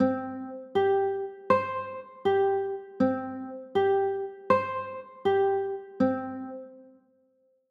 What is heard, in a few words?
c 6, chords